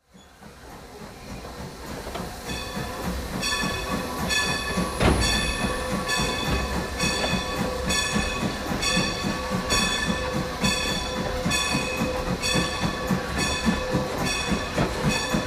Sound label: steam train chug bell